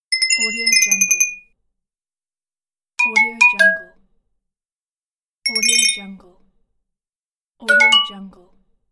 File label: cheery glassy notifications